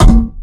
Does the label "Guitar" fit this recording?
No